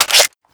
scar reload clip in, foley

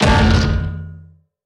damage hits explosions, Warships

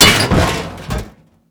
cigtray; Call of Duty Modern Warfare

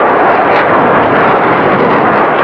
mig29 mid loop

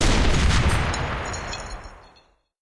20 23mm tail close, guns tanks

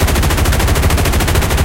type3 loop, guns; War Thunder

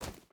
footstep hide gravel run; Rust

